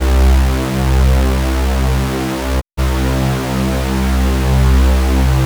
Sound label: supersaw